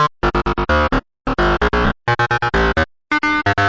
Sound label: the wargly bass